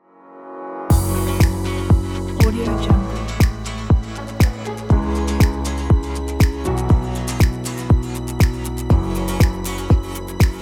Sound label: upbeat summer pop